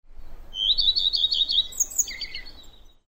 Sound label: redstart